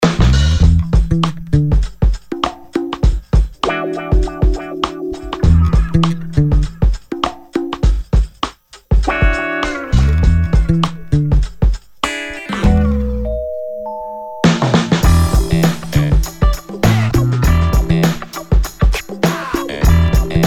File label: Groove